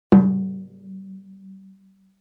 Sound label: snare drum mallets